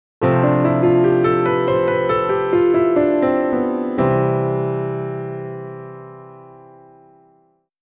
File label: major scale